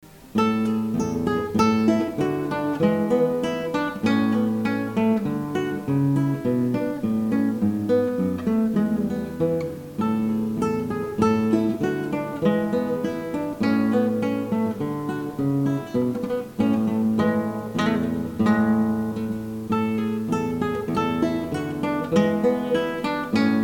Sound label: Gavotte